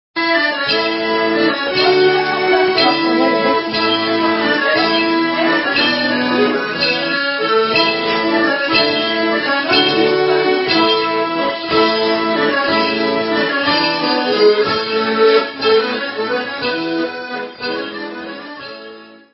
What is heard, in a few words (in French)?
Valse - france - auvergne; La Valse Des Roses